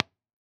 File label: Powerball SM57 Impulse